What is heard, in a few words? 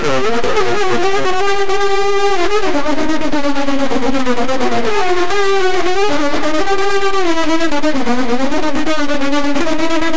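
Brazil drift phonk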